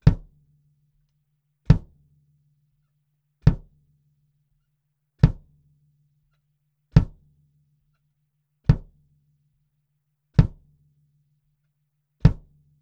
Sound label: kick sm57